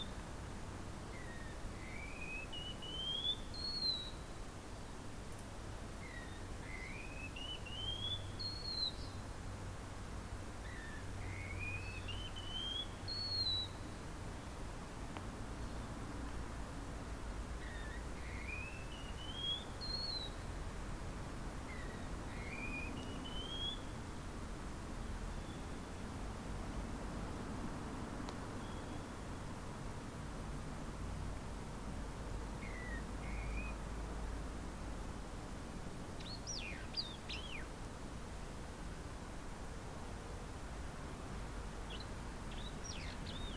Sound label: Wallcreeper